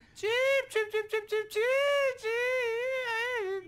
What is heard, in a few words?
cheep cheep cheep